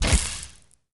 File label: overheat, plasma; plasma weap